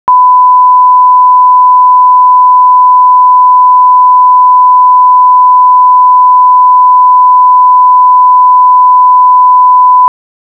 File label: 1kHz Sine Wave; CD Test Tones